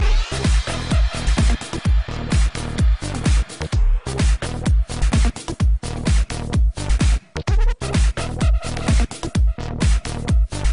Trance

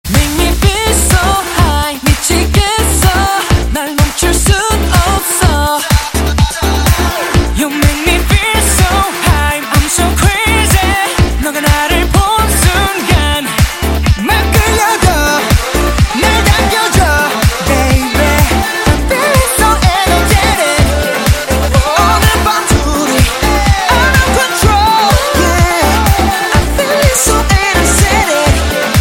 Energetic

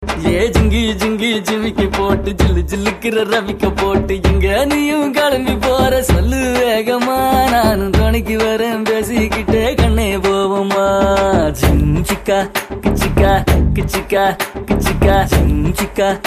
Ringtones

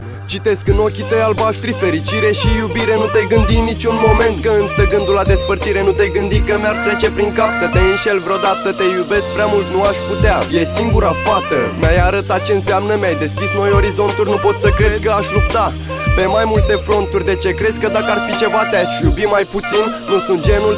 Super Melodia

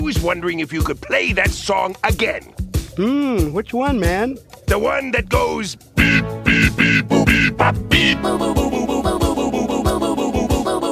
Africa

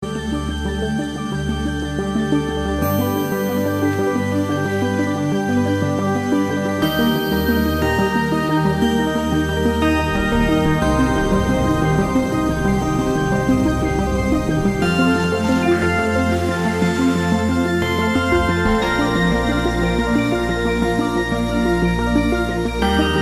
animation music

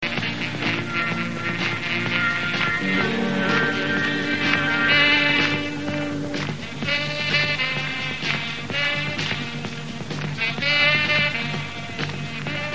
Annoying Ringtone

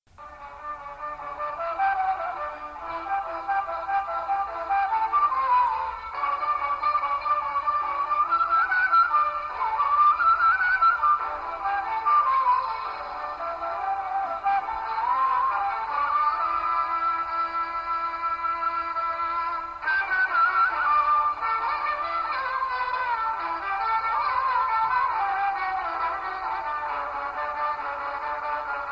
Carnatic

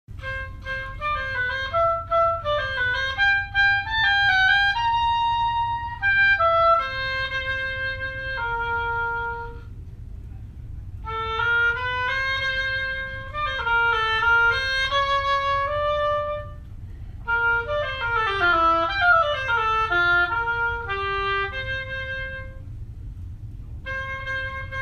solo ensemble

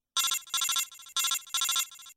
Radio Call; Mgs3

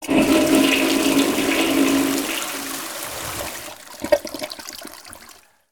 Toilet

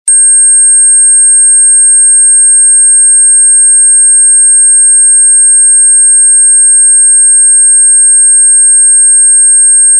8500hz buzz

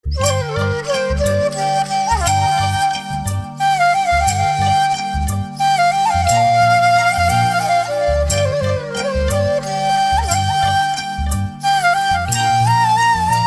Flute

